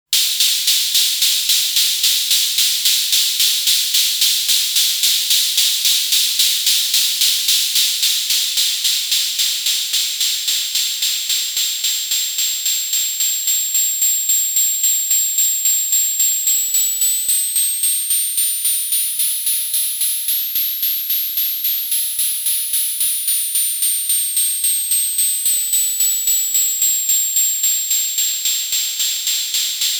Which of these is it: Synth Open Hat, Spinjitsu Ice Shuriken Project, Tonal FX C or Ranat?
Synth Open Hat